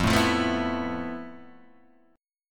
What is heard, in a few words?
F Major Sharp 11th Guitar DADGAD, chord; 3,0,3,2,2,2; down; chord shape